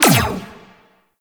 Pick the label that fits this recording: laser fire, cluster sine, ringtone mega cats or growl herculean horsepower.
laser fire